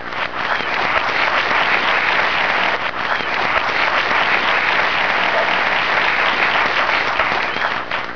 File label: APLAUSE